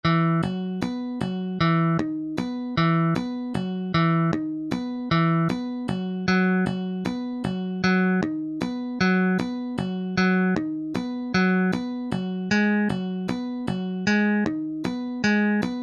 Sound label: picked bass